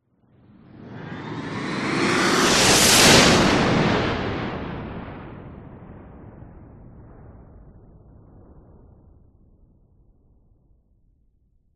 science fiction space fighter fly past very fast whizz by